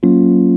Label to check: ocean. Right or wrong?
Wrong